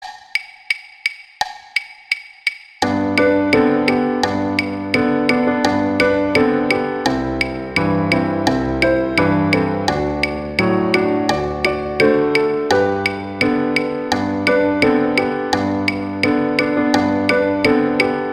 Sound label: Jingle Bells; Christmas